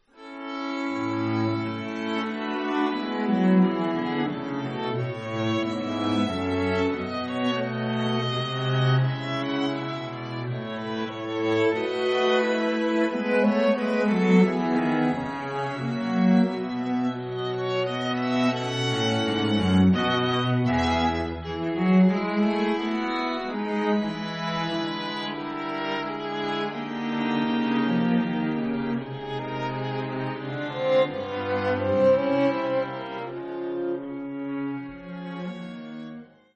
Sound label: Harmonie